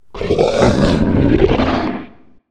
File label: Canine Growling, Monster Sounds; The Decline Of Warriors; Monster Sounds Volume II